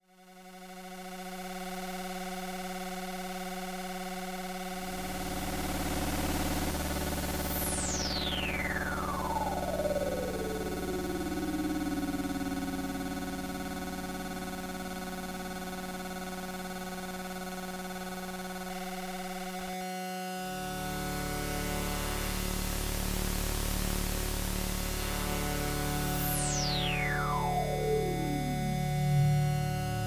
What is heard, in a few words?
D drone